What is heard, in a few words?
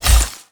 skill ice ring